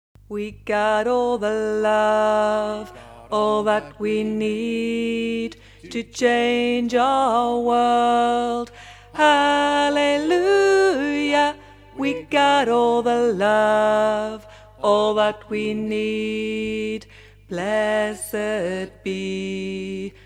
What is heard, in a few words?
tenor